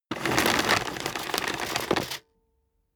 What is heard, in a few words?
vacuum cable pull in